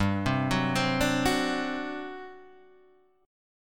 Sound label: G Major 7th Suspended 4th, G; GM7sus4 Chord {3 3 4 5 3 2} arpeggiated